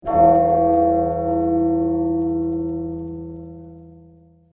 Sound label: Low Low Bell E